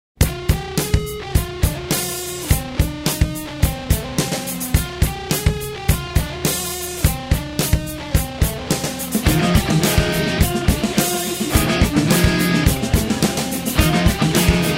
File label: Talk Box; Soundzabound Volume 5 Disc 2